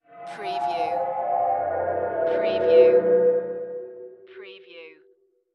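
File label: Production Element Feedback; Sound Effects Bible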